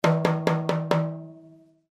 reuther tom 12

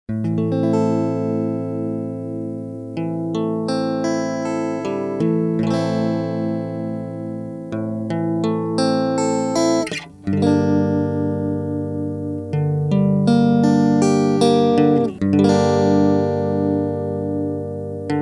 deamy strum; dr zee se cdc amp head

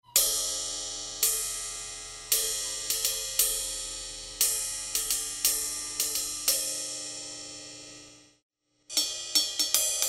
sabian b8 ride 20

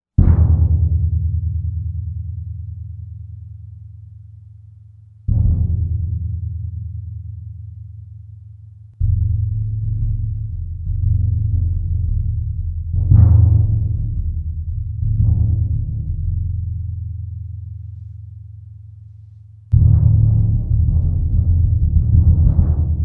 timpany 22x9

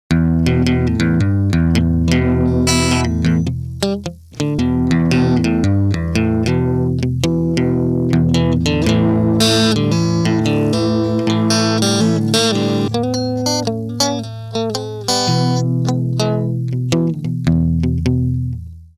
shuffling; dr zee se cdc amp head

